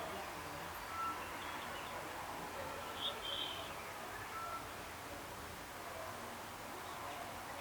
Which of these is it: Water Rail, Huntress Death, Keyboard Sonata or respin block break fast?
Water Rail